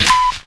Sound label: FX TONE; MIX FX; E-Lab; X Static Goldmine 2; akai